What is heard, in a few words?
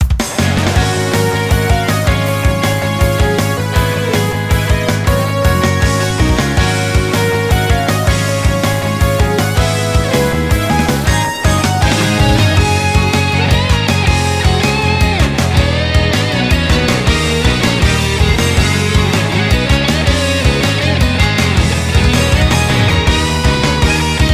Music Game General event